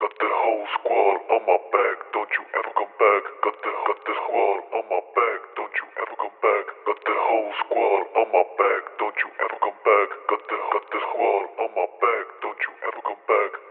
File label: Phonk Vocal 140 BPM; Cymatics; Squad